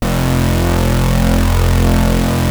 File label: Prophet 5 F2; Hollywood Edge - Giorgio Moroder Rare Synthesizer Collection